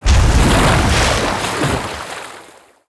Stalk Play; XhilagsStalk

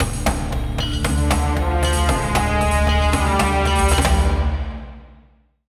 Stinger; Drilly the Kid

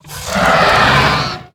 Plagued Hatchling Death

